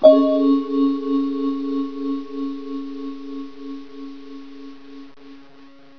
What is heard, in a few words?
mistery